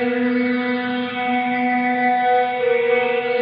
Gretsch Ring FX 140 B, guitar drone samples 140bpm